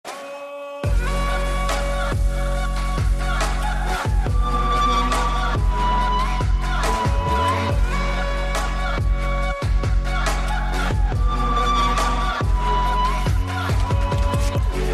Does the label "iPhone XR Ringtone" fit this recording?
Yes